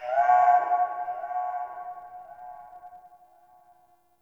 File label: Ext Texture Bird Small; Ambience; Misc Outdoor; Warframe; Infested Eris